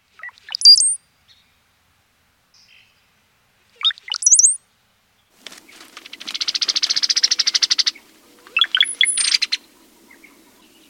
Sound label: cowbird; feeding the birds; Puckaway